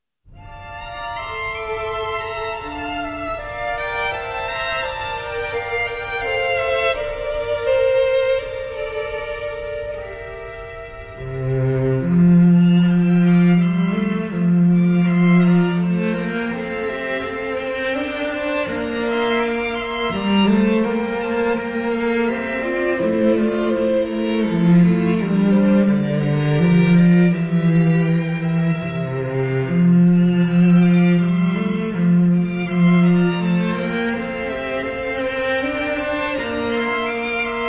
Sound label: String Quartet Christmas Medley; arr. Carlo Martelli